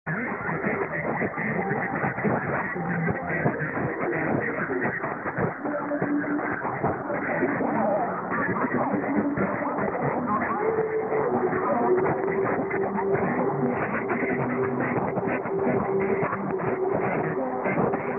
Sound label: Radio Suisse Romande Option Musique, Switzerland